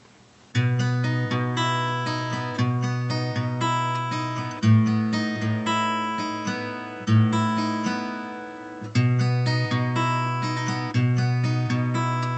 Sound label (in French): strum leger L 00